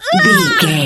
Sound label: voice female hurt pain